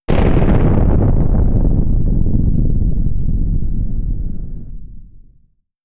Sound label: Large Collision